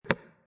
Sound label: stomp